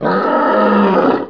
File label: Tiger Death; Playable Tutorial Level; TR2